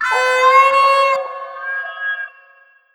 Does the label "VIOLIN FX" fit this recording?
Yes